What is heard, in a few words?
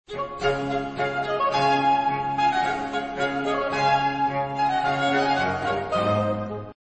D american baroque; MagnaLoops